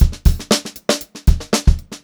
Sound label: 119 groovy beat 1, funk groove drumloops; USB Soundscan vol.38; AKAI